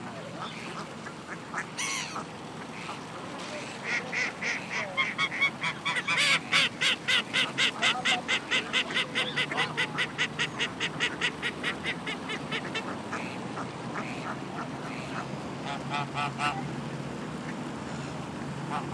ducks

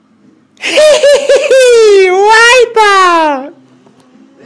wipe out

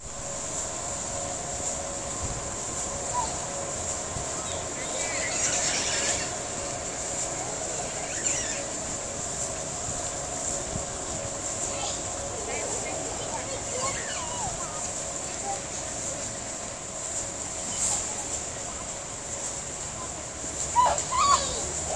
feed the pigeons and duck; weston park

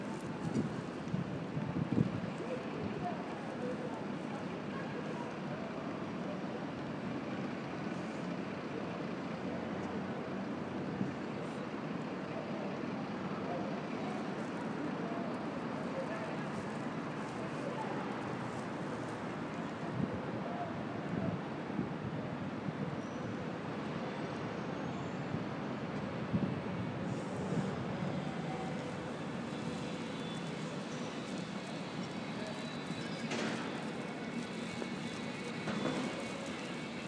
outside westminster cathedral

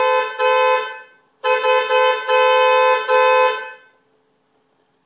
car horn

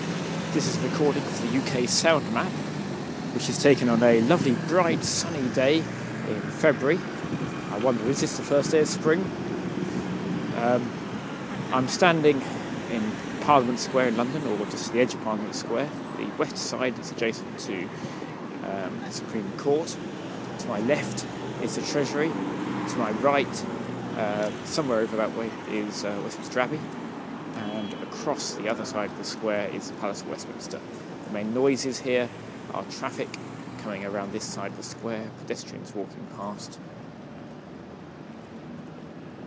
sunny day in Parliament Square; uksm

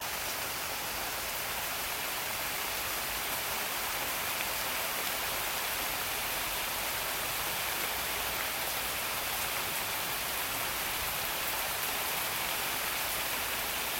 morning thunderstorm soundscape thunderstorm

